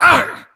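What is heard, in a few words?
voice hurt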